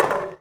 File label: Foley Mid, Drum Bits, Sweeteners; layering